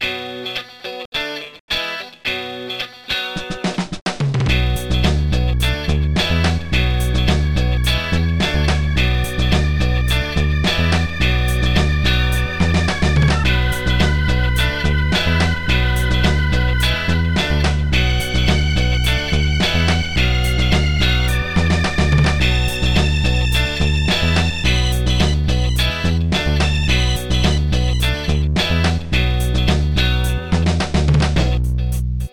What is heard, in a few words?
jazz